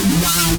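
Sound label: guitar (wah); Sonic Foundry (Sony Creative Software); Ugly Remnants VOL-2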